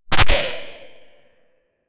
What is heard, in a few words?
cute zombie geting hit by; 50 caliber